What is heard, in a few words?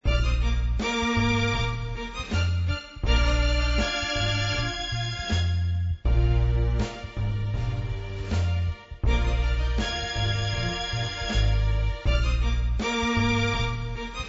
80Bb classic royalty free music